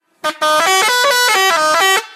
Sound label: bus horn